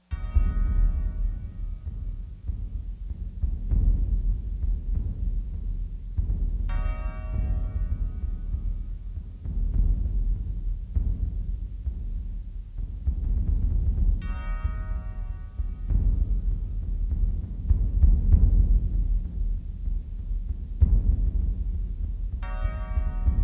Drum Bells; SWAT 4

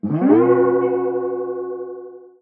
Chorus Slide; gamerip; Hylics

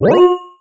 UI Zoom

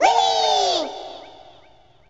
yay; stardunk ios gamerip

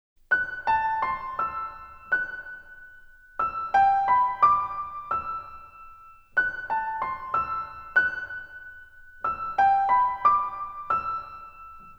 Short instrumental; Otome Soumu Yukari Koshiki; Ashta wa Aeru no Kashira

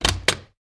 Refrigerator

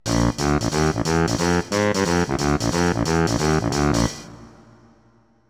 Saxophone Slam; Tiny's; Donkey Kong 64

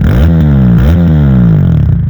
Car Rev

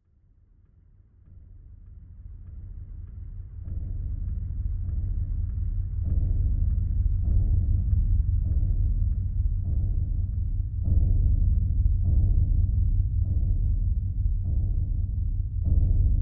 Underground Ambience; Dark Sun Wake of the Ravager; ms dos

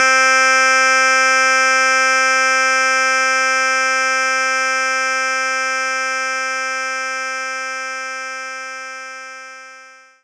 clavinet C4

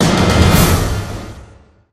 swell quick; transformers war for cybertron gamerip

